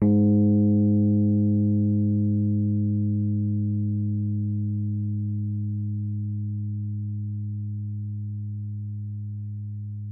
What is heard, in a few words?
G#2, Rhodes Mark II; mkII 88 key LO; CEPCo Sample Series Vol. 3